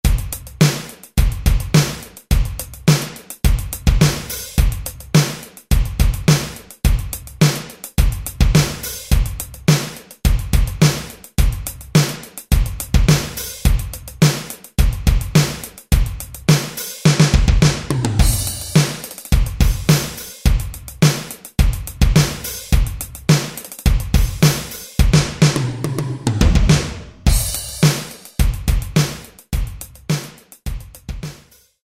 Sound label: Rock, Alesis SR-16; Preset Patterns